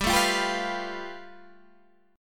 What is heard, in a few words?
A 7th Suspended 2nd Sharp 5th